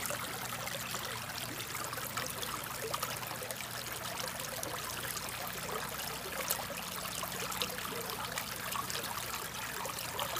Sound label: rushing water